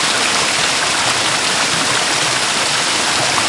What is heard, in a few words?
waterfall; Soldier Of Fortune II Double Helix; XBOX